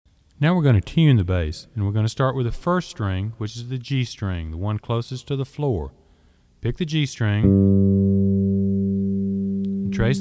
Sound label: Tuning The Bass